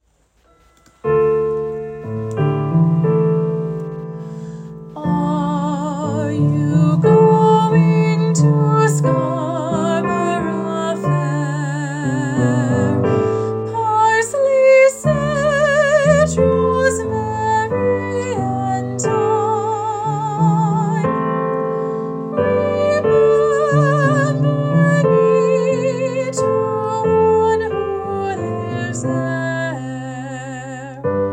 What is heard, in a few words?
Alto Example, chorus; Scarborough Fair; all-state-chorus